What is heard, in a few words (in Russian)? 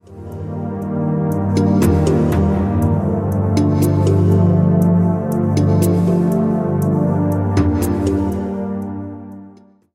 plavnaya muzika na sms